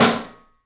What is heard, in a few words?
PIC SNR L, PICOLO ROOM, 3D Drums; 3D Sound